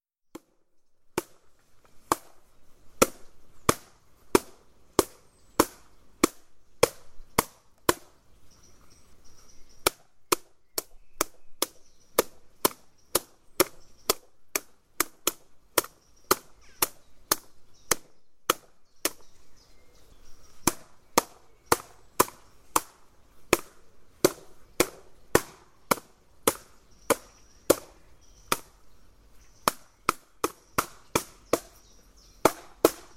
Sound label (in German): Teppich klopfen